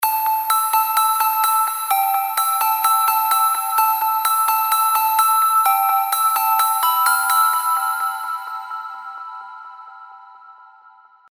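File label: Amin (128 BPM), progressive arps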